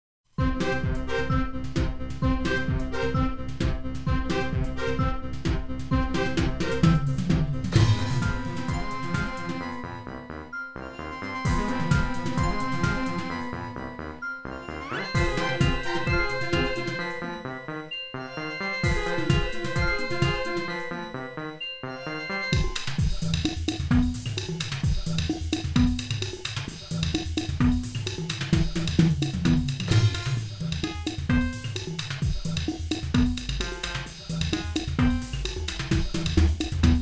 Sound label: midi Test